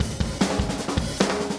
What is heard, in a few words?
Drums, Rock